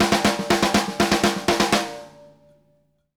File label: SNARE LICK; Sampleheads - New York City Drumworks VOL-1